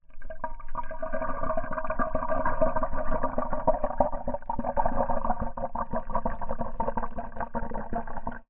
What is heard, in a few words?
hydrophone, submerged, small glass, effervescent tabs, carbonated drink, pouring; filling; gurgling; Eiravaein Works; Unfathomed